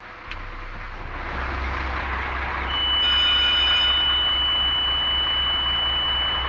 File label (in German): warnton drehleiter